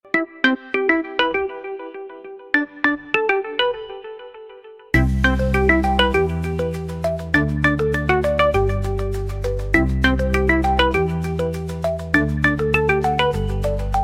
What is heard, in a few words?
We Can Electronic; Tecno Spark 40C; alarms